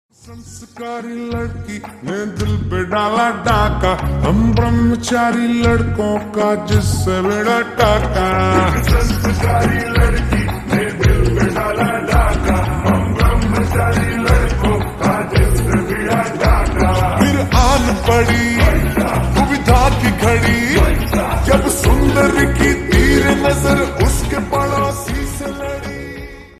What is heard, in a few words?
Slowed Reverb Ringtone